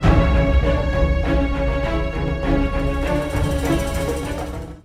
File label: music jingle tension; Jack Keane 2 The Fire Within; Windows gamerip 2012; africa mountain